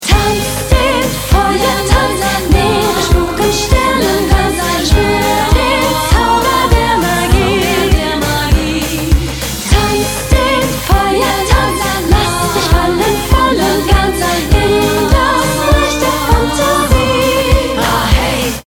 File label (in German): Feuertanz, keltischen und mittelalterlichen Klänge; Wettbewerb